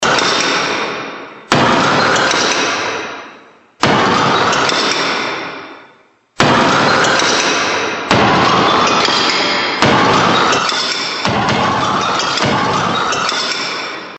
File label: break